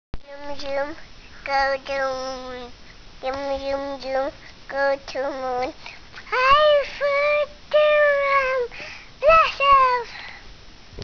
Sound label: zoom zoom